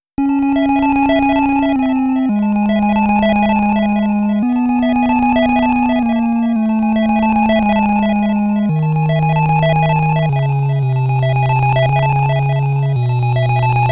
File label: Dungeon; Ninja JaJaMaru Collection Original Soundtrack